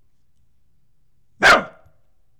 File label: dog bark